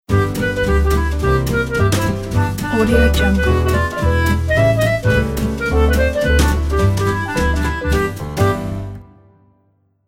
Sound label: fast swinging logo